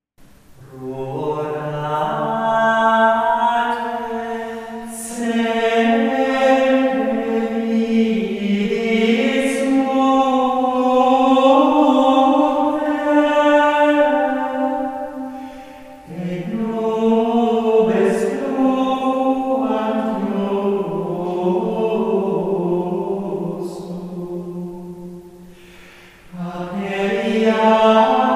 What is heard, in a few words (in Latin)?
rorate coeli